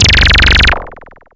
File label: scifi, SCIFI & SWEEPS; Techno Trance; FX & ATMO; Techno Trance Essentials